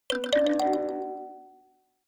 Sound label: notification kalimba delay triad up minor high